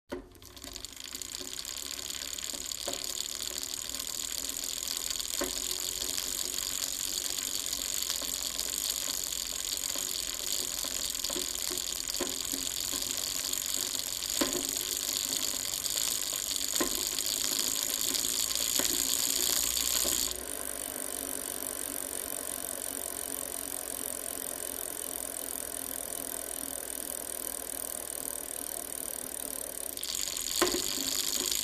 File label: Bicycle Pedal Coast